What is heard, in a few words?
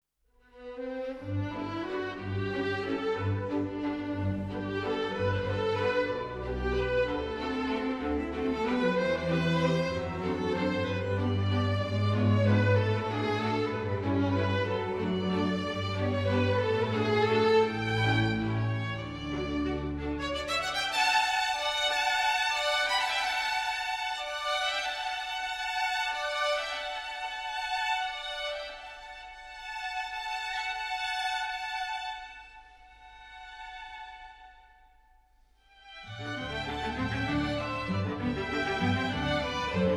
Serenade for Strings; P.Tchaikovsky; Sondeckis